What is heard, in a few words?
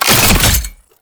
Fuel Projectile Pick Up; Warframe; Jade Shadows; Ascension